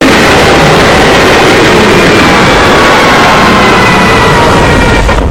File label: jumpscare sound; ultrastigma